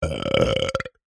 human male burp mouth wide open; zapsplat

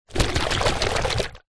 hot water bottle rubber full water shake; household

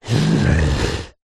horror yeti monster sigh breathy frustrated

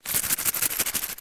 foley money shake in envelope

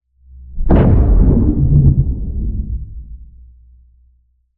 impact underwater or space quakerish; lukas tvrdon

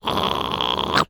cartoon dog growl

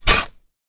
industrial shovel metal dig dirt soil; zapsplat

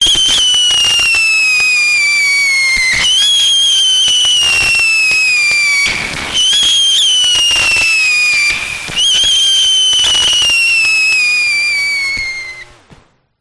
Glitch Decimated; Glitch Factory 01; sound spark

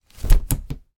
household umbrella open; sound effects one

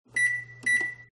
oven timer; fox audio

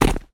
footstep dry forest floor; foley

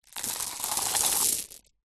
stones gems tip from plastic cup onto ground; foley; zapsplat

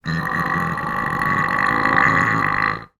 human male vocal strain long

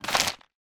food chocolates multipack 4 pick up from table; smarties; zapsplat

